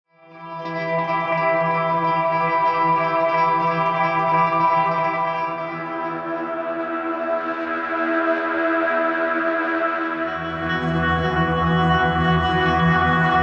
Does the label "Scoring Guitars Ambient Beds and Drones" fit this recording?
Yes